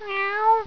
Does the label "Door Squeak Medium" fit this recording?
No